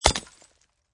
Bullet Impact Glass Bottle, Projectile impacts with glass bottle